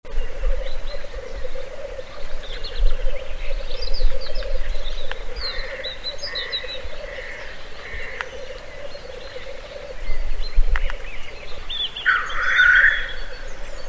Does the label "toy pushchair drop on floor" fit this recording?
No